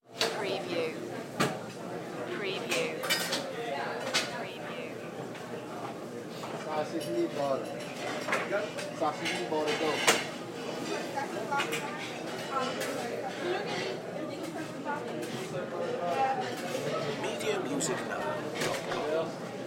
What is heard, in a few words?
Restaurant Pizza; Sound Effects Bible